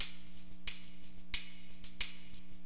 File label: TAMB, Perc